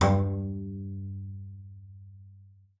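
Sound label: bass col legno G2; Xsample VOL-5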